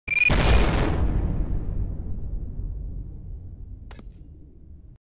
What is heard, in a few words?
damage sound effect haunted